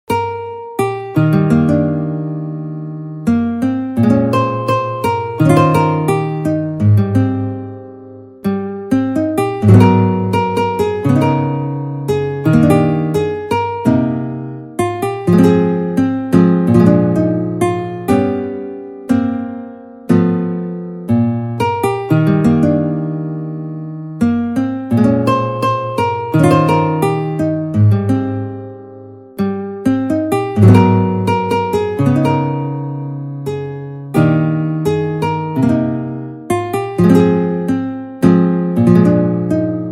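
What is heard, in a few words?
nylon string acoustic guitar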